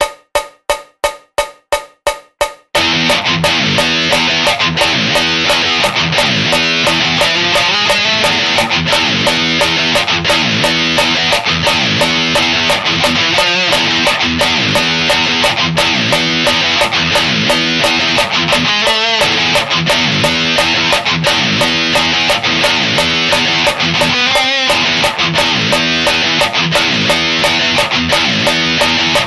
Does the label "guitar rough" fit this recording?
Yes